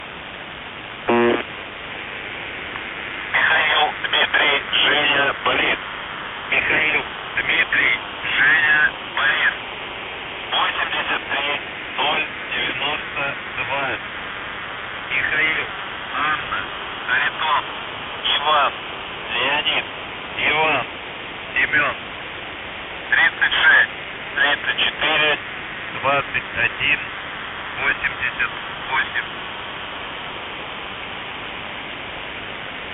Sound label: buzzer